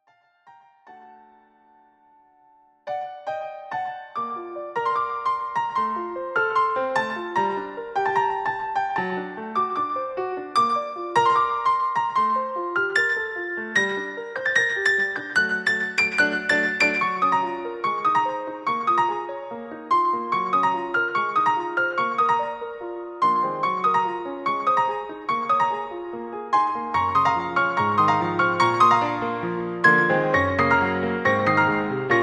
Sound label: piano